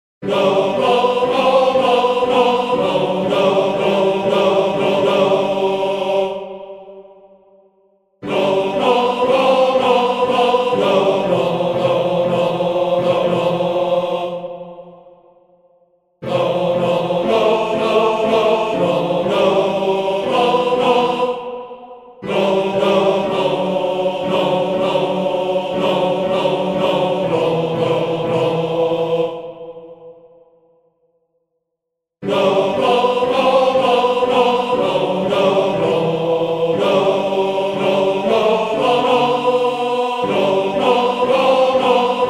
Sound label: Bariton